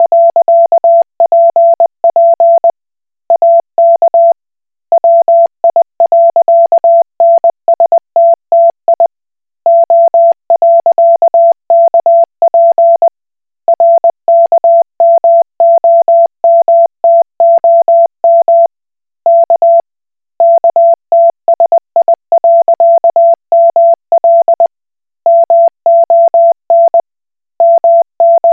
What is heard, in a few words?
Koch Morse